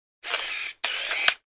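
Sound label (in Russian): zoom kamery, camera zoom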